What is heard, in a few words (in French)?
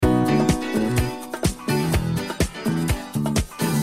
Majeur ou mineur B